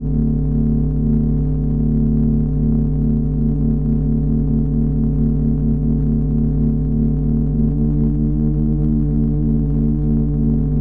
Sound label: Bass Drone